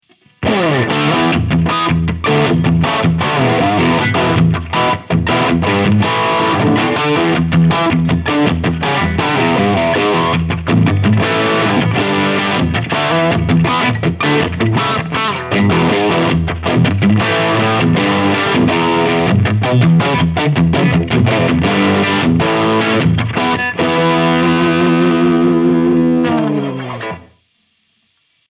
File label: Sound City Master One Hundred Humbucker Cross Cable